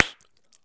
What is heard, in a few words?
Bubblegum Pop; SFX Kit; Foley - Misc